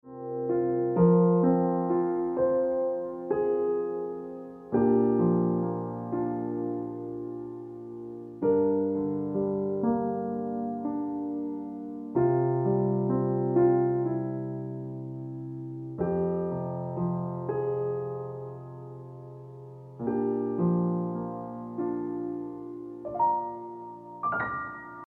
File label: Ringtone